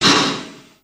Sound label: Train Chuff Generic, steam train